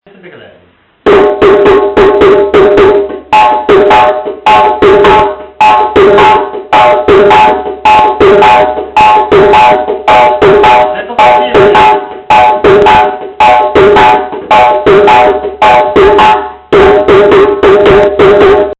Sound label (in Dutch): Kadan Ritme